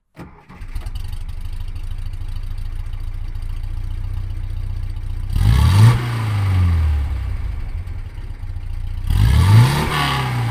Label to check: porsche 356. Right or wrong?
Right